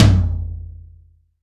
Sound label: high tom one shot e key; tom drum; tom drum samples